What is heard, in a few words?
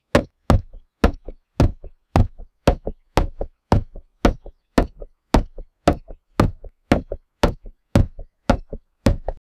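running footstep of basketball; regular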